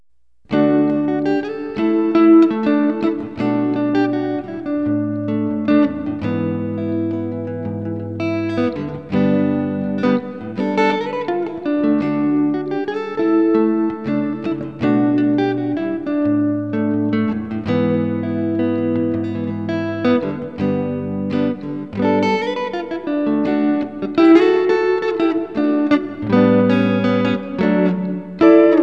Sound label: pop rock; SoftKey Entertainment Pack; SoftKey Multimedia